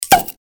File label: drinks beer bottle opening plopp